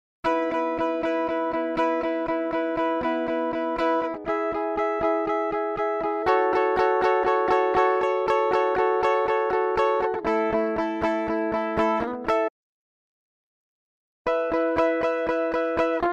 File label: Direct Input with Shot